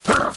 footstep

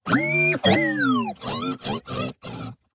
extremely pleased beeps; 堡垒（守望先锋2）; 局内触发

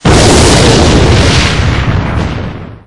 rocket hit

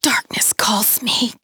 death; 阿斯拉（KRL）

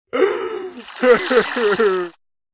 Crying

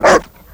Dog bark Mean

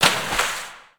Dolphin splash

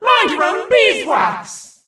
mon hurt vo

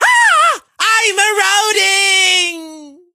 death vo; carl

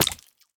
fish hurt; Minecraft

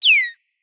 dolphin water idle; Minecraft

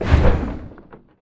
Ballista attack effort; 投刃车（魔兽争霸3）; 远程攻城武器

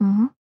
tap

